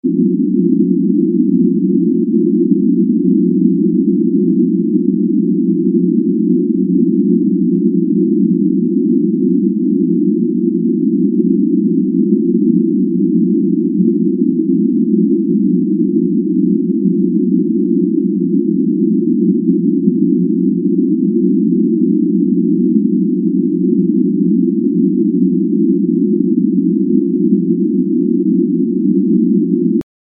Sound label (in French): bruit rose